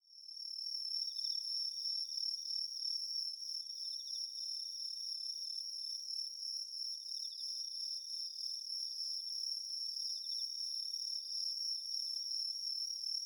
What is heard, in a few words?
Singing Of Insects; LOOPERS